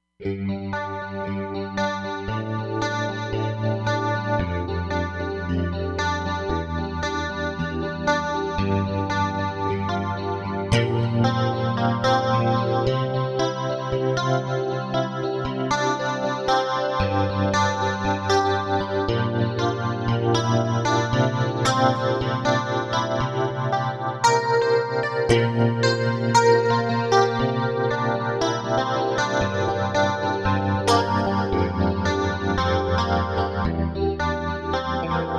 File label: Twin Strats, Roland Fantom XR